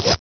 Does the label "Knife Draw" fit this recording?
Yes